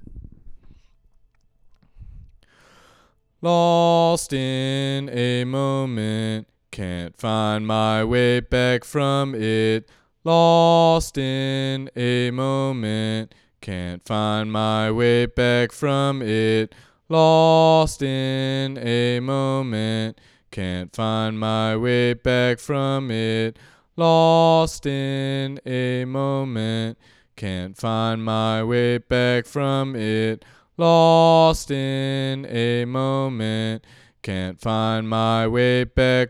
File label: Male Ambient Lead Voc